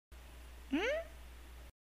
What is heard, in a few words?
hum